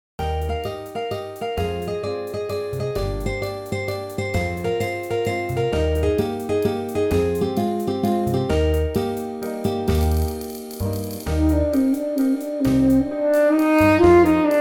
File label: waltz; wynndel